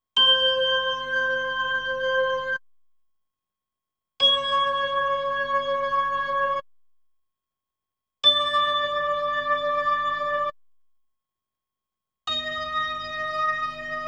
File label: B3 Organ; Masterbits CLIMAX 8